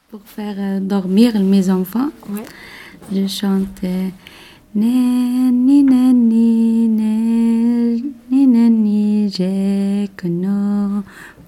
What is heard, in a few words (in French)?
Chant; ABBAYE